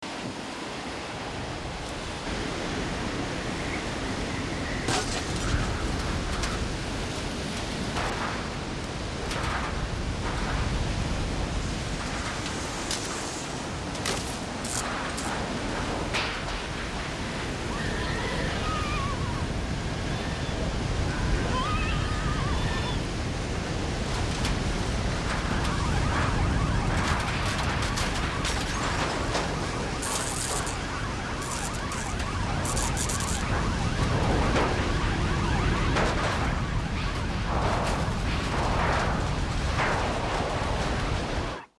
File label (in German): sturm regen wellblech glasbruch stromschlag